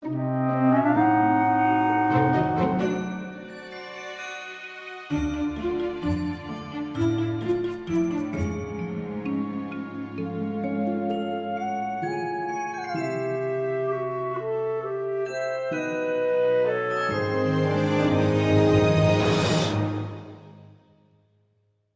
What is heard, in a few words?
Projector Screen Training; Epic Mickey; Wii